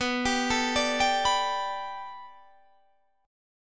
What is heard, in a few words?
B Minor 7th Sharp 5th B Guitar Standard, chord; Bm7#5; 19,22,19,19,20,19; arpeggiated; capo=2